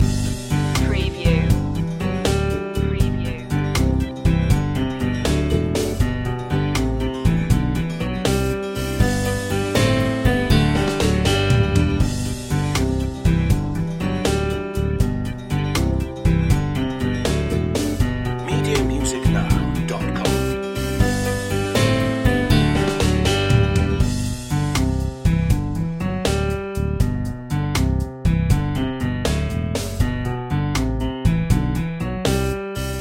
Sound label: humpty dumpty instr loop